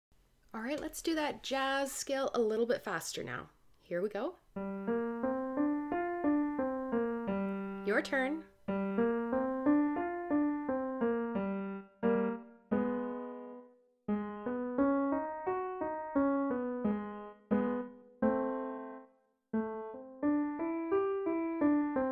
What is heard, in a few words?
jazz faster